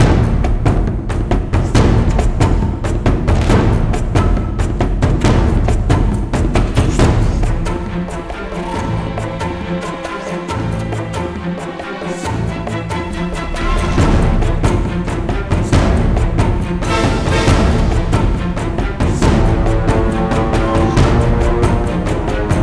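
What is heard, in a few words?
Mid Combat loop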